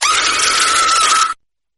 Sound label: scary maze game scream